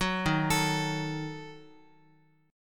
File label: D sharp Diminished D sharp Guitar; arpeggiated; D#dim; 9,11,12,x,x,x; JGuitar